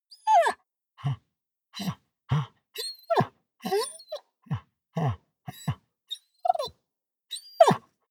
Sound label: dog squeak pant; natur-tiere; hunde